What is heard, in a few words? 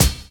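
drum chop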